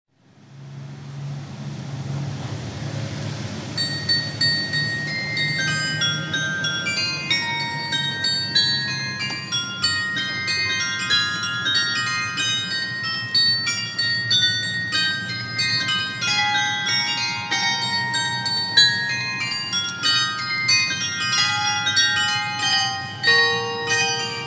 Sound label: carillon; Kerst